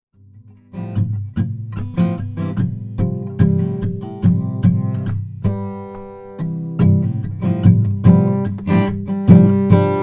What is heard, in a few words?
froggy bottom f12 custom; 1996